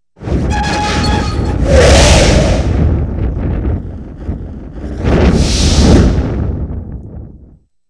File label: Explosion, 5.1 Surround